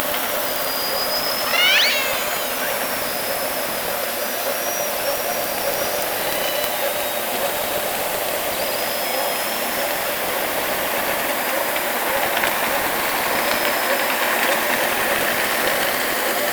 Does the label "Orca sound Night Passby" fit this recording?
Yes